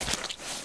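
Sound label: player water splash; firearms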